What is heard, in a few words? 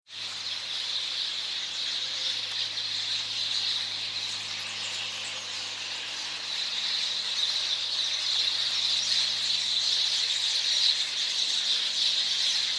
Passer domesticus; SETE